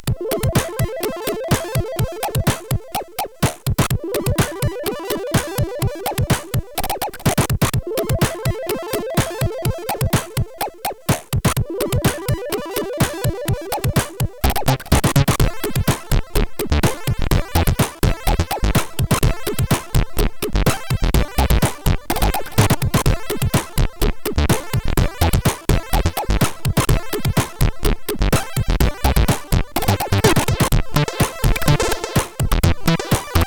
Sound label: Speed Tune, sid CSG8580R5